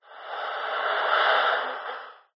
horror ghost evp eerie breathing fearful processed; zapsplat